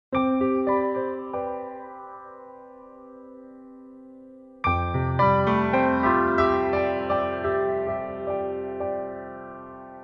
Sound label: Tri Dreamy